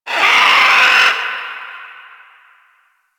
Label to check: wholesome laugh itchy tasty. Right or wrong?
Wrong